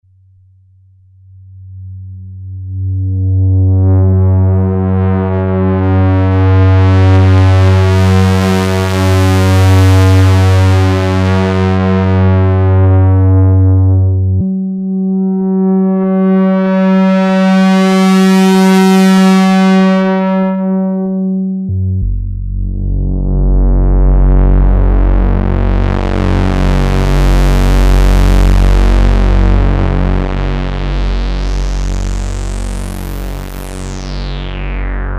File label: DVCO+MVCF pwm